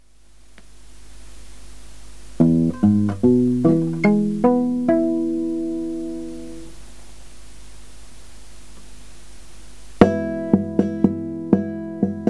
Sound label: pizz, guitar